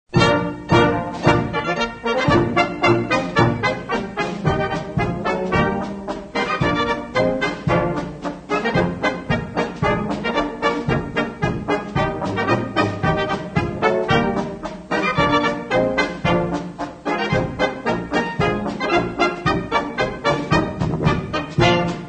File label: ARISE MY DARLING, polka